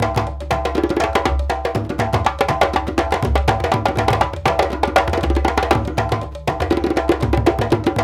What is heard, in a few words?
Lamba, African Contemporary; Spectrasonics; Supreme Beats